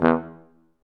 F TUBA, stacc; Roland L-CDX-03 Disk 2; BRS Tuba

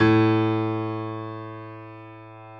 piano A1; AKAI S-Series CD-ROM Sound Library VOL-8

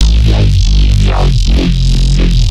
Growl Bass; neuro; BIG5AUDIO

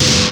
FIRE SNR, Drums; Distored Reality 1&2

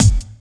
bass drum, 145 BPM, Drum & Bass; Ueberschall; Drum & Bass Flash Trackz

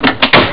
car door; Amiga Soundtracker sample

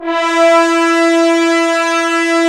BRS BNSF2QL, Roland LA Composer, Tbns; 90 s Sample CDs

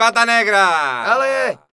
flamenco vocals, male

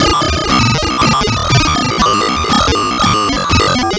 Random, LFO & Noise

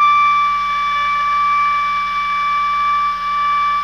SOP PP D 5, Saxophones; Greytsounds; Philip Wolfe David Crozier's Saxophones

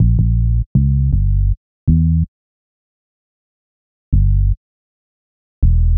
Smooth Bass; Sonic Foundry (Sony Creative Software); Knutrix Drum & Bass

